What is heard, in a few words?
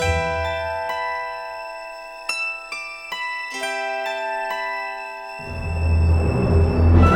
Vamp Loop to Continue; The Ladder